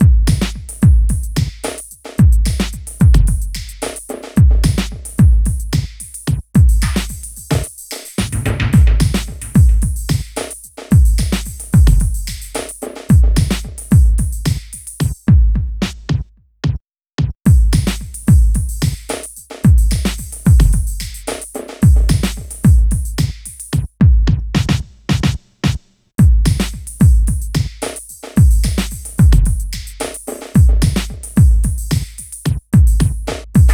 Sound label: Beat, Drums, Eclectic Electronica